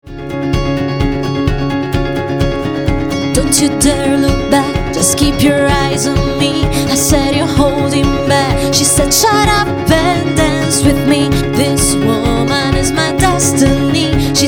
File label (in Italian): canto